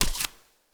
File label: Footsteps Barefoot One